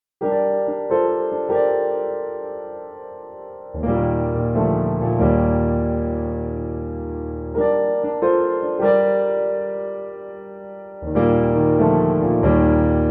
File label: piano